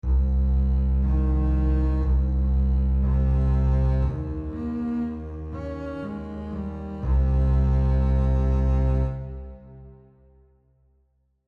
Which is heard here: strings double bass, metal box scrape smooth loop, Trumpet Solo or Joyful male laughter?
strings double bass